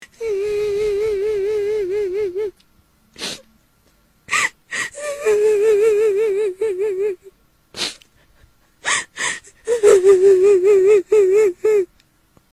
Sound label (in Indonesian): Tangis